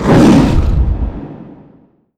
leap; weapons; dota; mirana

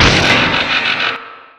flack cannon activate; weapons; dota; gyrocopter

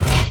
metalheavy pickup; ui; inventory

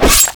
attack; antimage

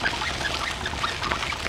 unicycle move; weapons; dota; ringmaster

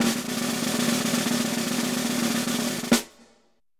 drum roll; soundboard

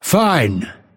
move pain; dota; phantom assassin

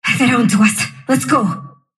sylph move pain; dota; dark willow

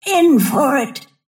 move pain; snapfire

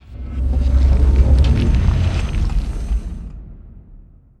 wheel slowdown; ui; economy

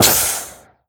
snake impact; weapons; dota; medusa

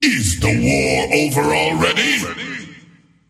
swipe; skel arc; dota; skeleton king

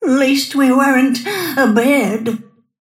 death; snapfire

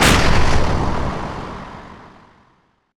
righteous target; zuus

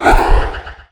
impetus; weapons; dota; enchantress

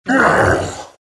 morph pain; terrorblade

